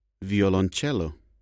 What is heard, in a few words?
musical instruments